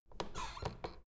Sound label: door plastic handle pull up creak soft